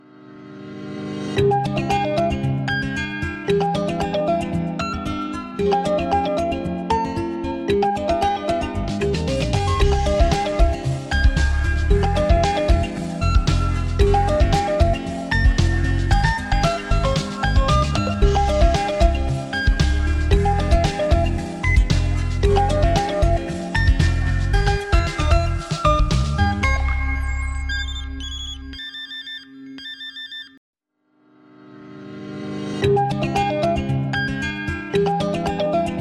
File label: Vivo Ringtone